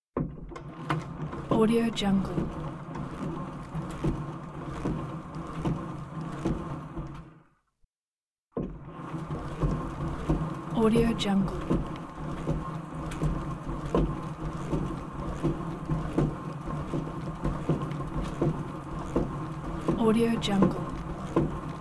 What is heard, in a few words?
exercise bike